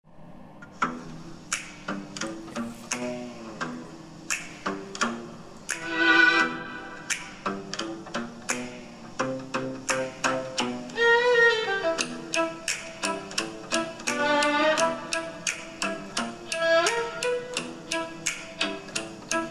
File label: Vocal; Cheshire; CHESHIRE CAT; VOCAL GUIDE